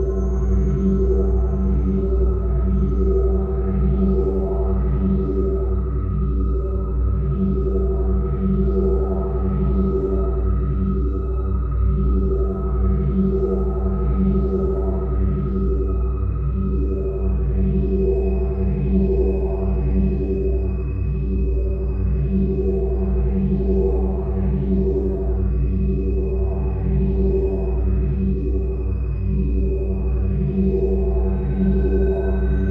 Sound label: synthqueen ship small ship idle; Stellaris; machineage